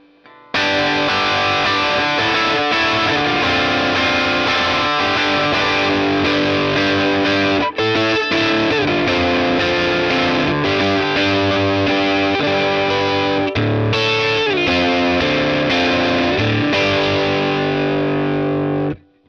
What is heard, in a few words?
3 pickup, FANO JM6; Single Line Verb